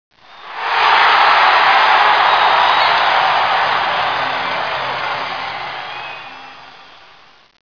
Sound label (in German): tor, soccer; firearms